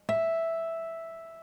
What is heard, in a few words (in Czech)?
flažolet 12fret, struna; KMITÁNÍ A VLNĚNÍ